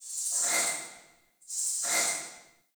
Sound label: Cave Ocean Creature Close; Midrath Island